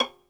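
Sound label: solo violin, strings; ILIO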